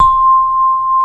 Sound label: MLT VIBE